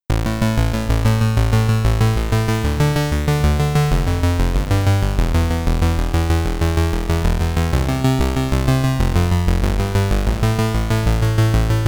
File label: Power Up, SID Stereo, Commodore 64; Dulcedo Cogitationis